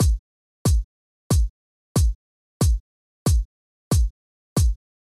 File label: Loop Kick